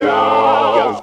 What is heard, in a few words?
"yo", group sung; ooh ahh